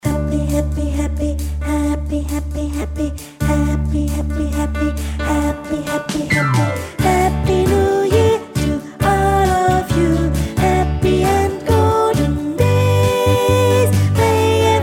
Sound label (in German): Sopran